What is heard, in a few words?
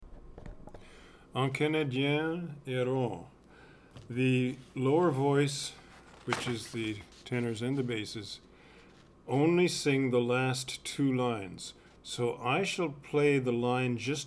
un canadien errant bass, choir